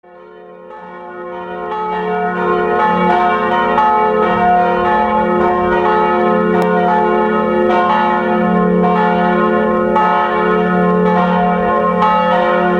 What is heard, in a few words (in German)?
franziskusglocken